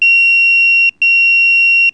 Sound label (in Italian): squillo